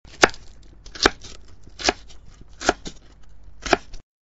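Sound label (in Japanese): manaita, cutting board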